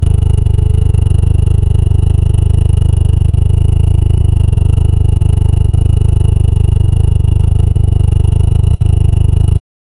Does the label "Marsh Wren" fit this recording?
No